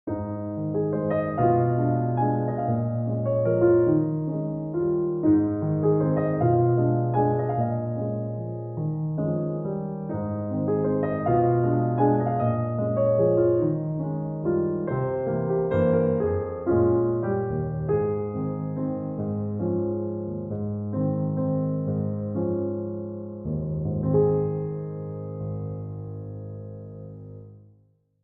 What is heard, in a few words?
Solo Piano